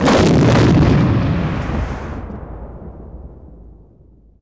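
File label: combat explode bwing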